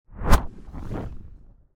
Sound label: Fire Whoosh Small